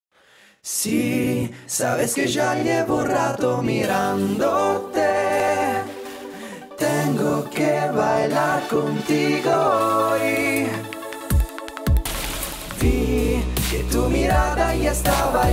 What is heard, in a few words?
Dubstep Remix